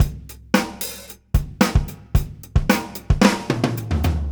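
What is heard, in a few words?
Beat Loops, 111 bpm; Zero-G - Monster Beats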